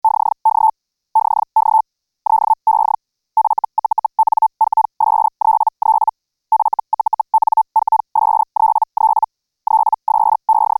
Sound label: hell, digital mode